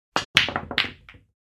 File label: Pool break shot